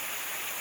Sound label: water vent; theGoonies; Retro